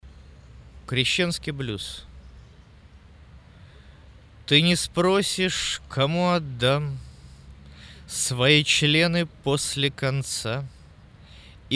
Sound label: blues; kreschenskiy